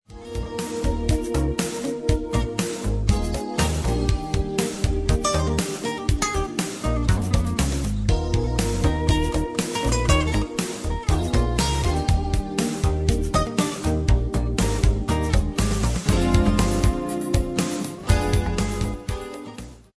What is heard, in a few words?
jazz smooth